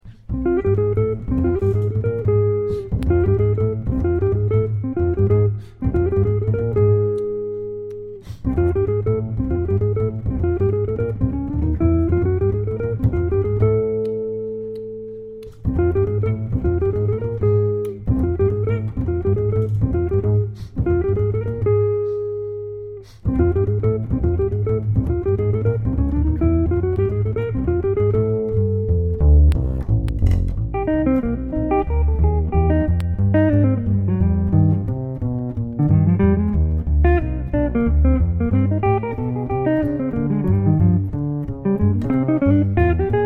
Duo guitar bass; Jazz Duo

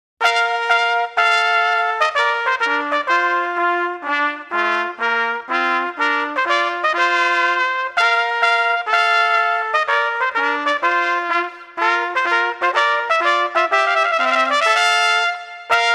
Trumpet Tune, 2 solo trumpets

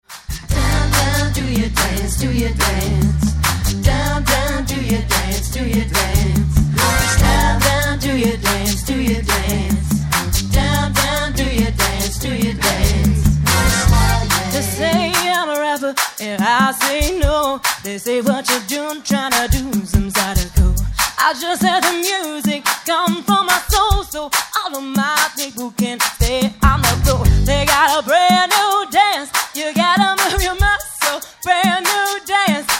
Cover Band

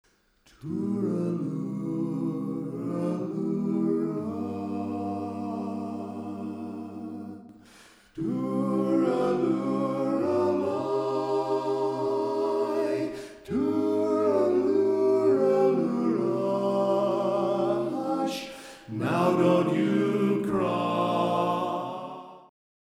Irish Lullaby, barbershop quartet

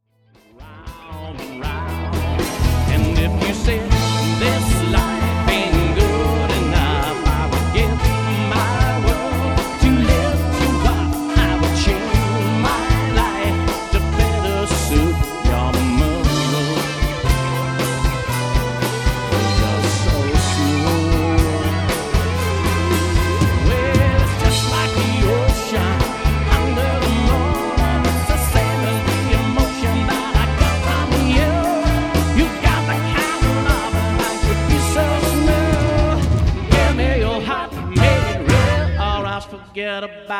cover band

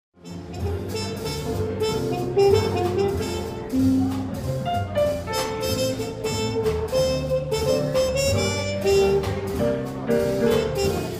Quartet with Trumpet, Jazz

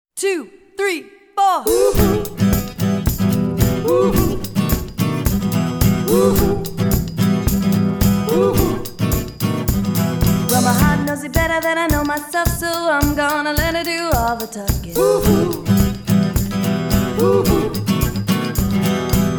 Pop, variety band; Cool Beans

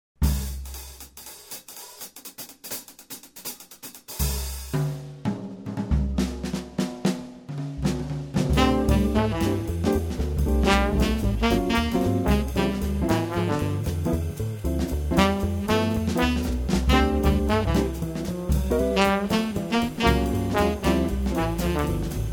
quintet; Swing

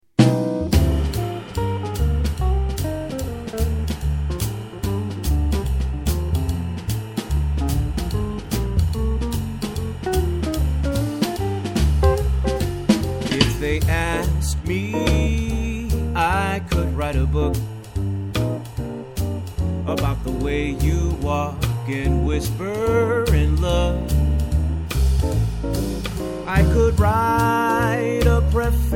Jazz Band

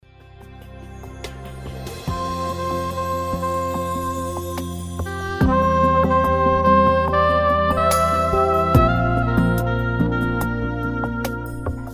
Saxophone; Larry Clark

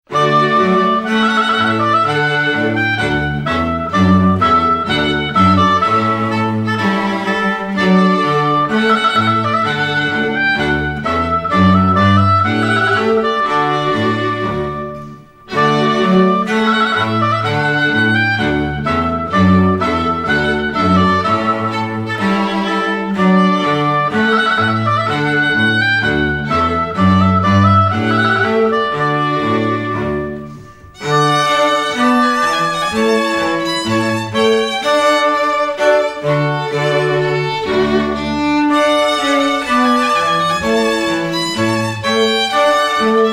trumpet voluntary, classical trio

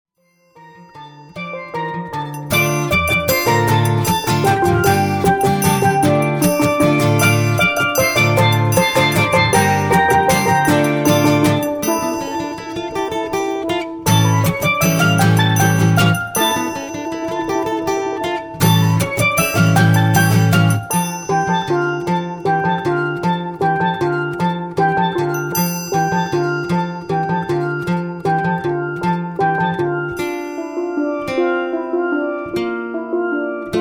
steel drum band; Caribbean Band; Carol Of The Bells

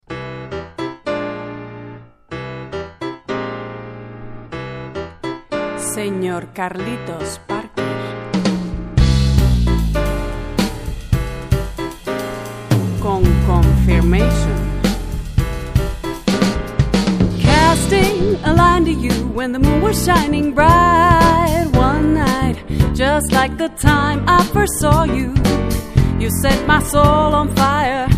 Latin Jazz With Vocalist Cocktail Dinner Ambiance; Cover Band

